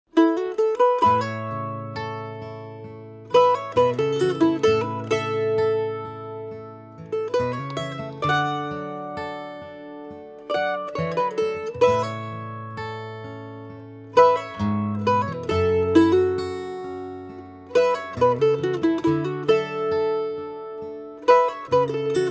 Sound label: Lonesome Mandolin